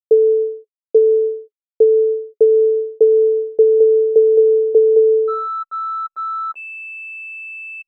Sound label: Parking Sensor